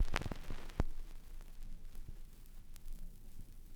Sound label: X CRCK R, RECORD-FX; XP RECORD-FX; Best Service; XXL Production Effects